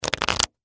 Game Cards Flip, Sort, Board, Property, Cards, Large; Sports; Monopoly; Board Game Sound Effects